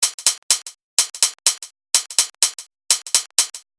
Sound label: 125 LP Hat Groove